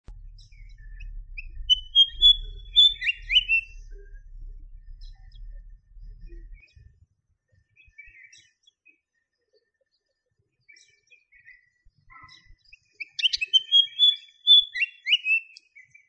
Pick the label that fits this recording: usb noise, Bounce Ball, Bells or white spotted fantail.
white spotted fantail